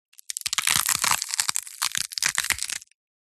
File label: horror broken bone arm leg limb twist dislocate; zapsplat